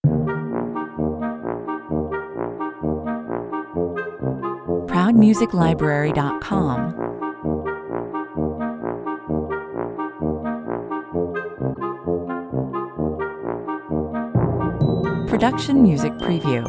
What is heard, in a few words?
Dizzy, Perky, Cheery